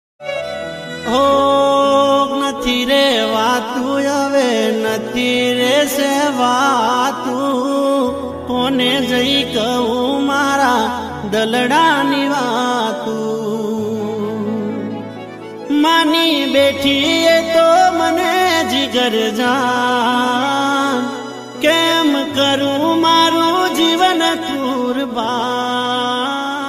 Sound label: gujarati emotional ringtone